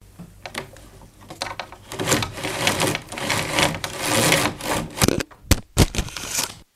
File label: Pulling Open Window Blinds